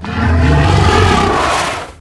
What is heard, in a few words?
Monster